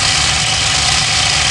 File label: Barrel Spin Loop, Machine Gun